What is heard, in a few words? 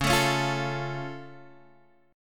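F Augmented D flat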